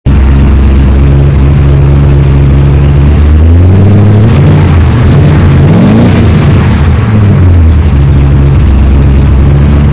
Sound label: twin, v8 mini